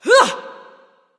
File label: grunt attack; smite; awilix